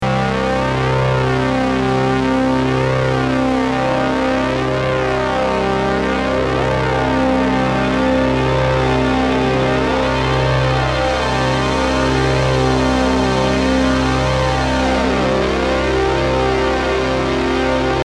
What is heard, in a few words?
juno106 smashed